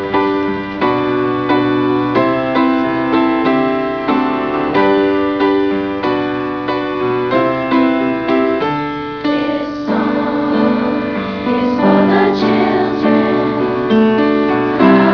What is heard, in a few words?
children